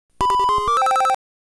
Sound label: Bonus Game - Success Theme; Super Mario Land; DMG; GB